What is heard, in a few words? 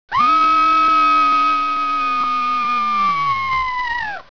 scream female